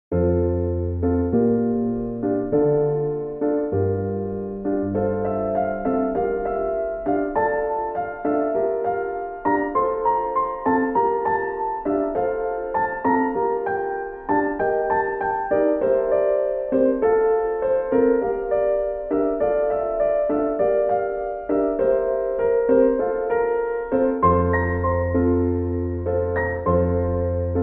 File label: Chillout Lounge Relax Christmas, Merry Lofi Christmas